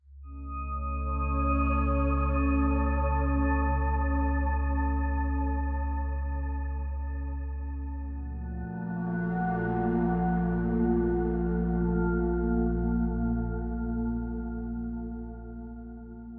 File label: Robot Stinger; soma gamerip 2015